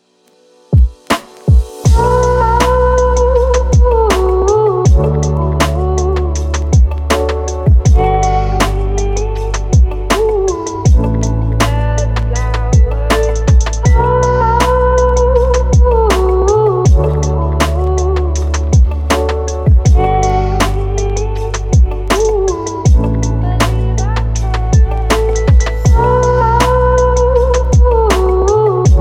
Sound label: chill lo-fi sentimental; MotionElements